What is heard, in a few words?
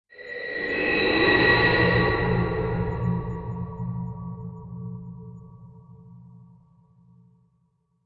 Sting